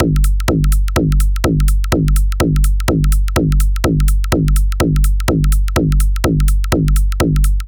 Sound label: 125 BPM, Digital Breaks; Loopmasters; Jon Carter & Alex Blanco; Block Party Essentials